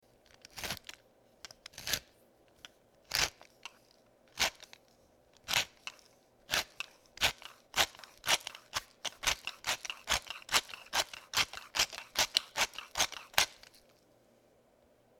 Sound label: pepper grinder